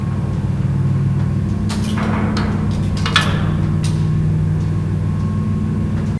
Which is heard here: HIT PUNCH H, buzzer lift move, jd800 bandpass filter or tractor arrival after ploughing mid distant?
buzzer lift move